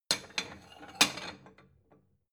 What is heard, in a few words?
plate small shelve slide soft